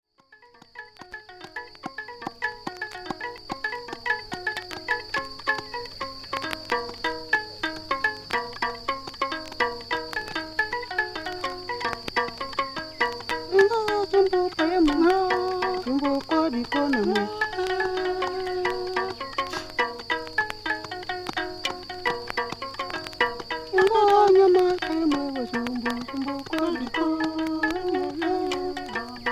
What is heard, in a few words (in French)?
musique centrafricaine